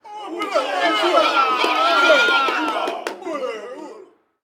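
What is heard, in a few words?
Street Ball Crowd Jungle Encourage, Crowds; SFX Kit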